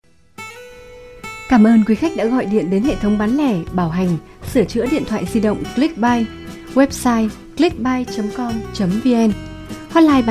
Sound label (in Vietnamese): Nhạc Chờ Quảng Cáo